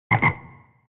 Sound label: jump